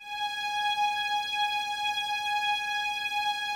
VNS G#5, Violins, String Ensembles; Miroslav Vitous

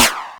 Snare Clap; Zero-G Club Techno; Percussion

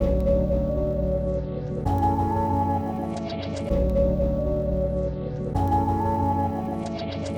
Chd Fx Loop 130; Equipped Music; Loungin House

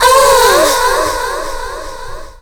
vox special; Ueberschall; Techno Trance Essentials

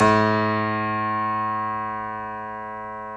PNO, Tack Piano; Club-50 - Foundations Roland

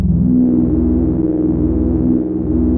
JUP.8 C3, JUPITER 8, Rare Synthesizer; Hollywood Edge - Giorgio Moroder Rare Synthesizer Collection

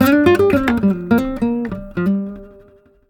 G MINOR LICK, Nylon Guitar

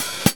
HAT SOFT CLSE, VINTAGE FUNK; Zero-G; Funky Elements

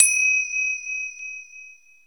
rama cymbol, Ethnic Percussion

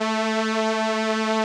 SOLINA, String Machines

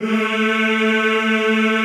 A2, Classical Choir; Best Service; Extended Classical Choir

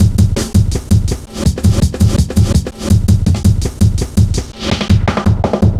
Hard Edged, Drum Loops, 165.5

